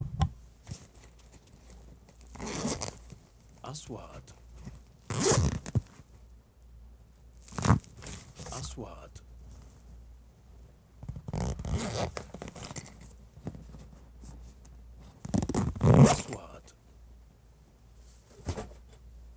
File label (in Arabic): صوت حقيبة معدات التصوير; sound effect; shooting